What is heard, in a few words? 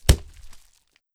Bullet Hit; Legend of Keepers